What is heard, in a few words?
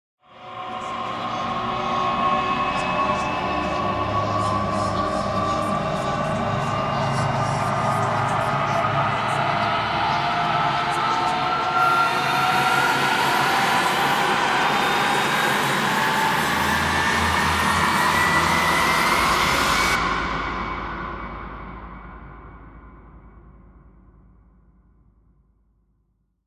Rise; Ninja Tracks